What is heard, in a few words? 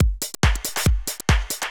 TECHNO BEATS 140